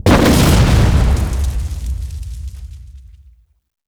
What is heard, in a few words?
torcher ranged impact